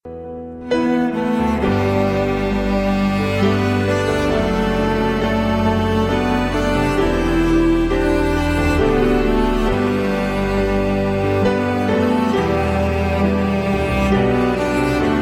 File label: Tamil Christian Instrumental Ringtone